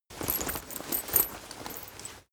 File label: leather metal handling short